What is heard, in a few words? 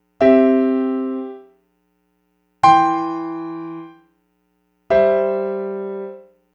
Root First Second Open